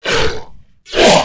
attack; tera creatures; abomination guard

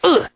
pain; sanic

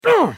pain

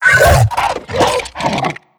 minion run chatter; tf; slender; attack of the radioactive thing

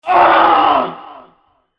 scream

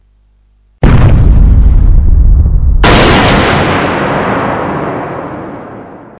hellfire eruption; Skeleton King

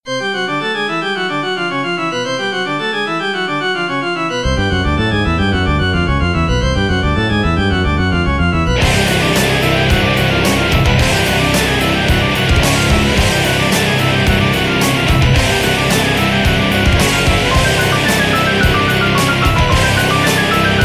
metal intro; danmaku fortress; okuu

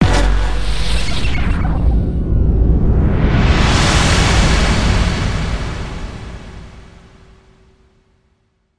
pong

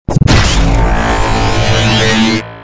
ar2 hit

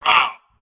hurt

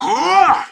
jump

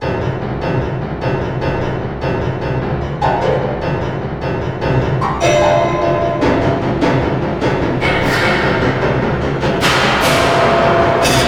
wretch chase; A Machine for Pigs

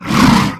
roar short; tf

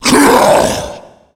tank attack, zombie vo; Left 4 Fortress

